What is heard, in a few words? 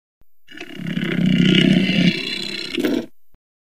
Snake, King Growl. Close Perspective.